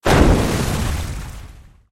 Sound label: explosion